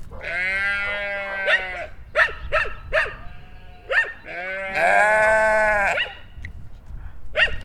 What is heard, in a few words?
sheeps baaing dog nearby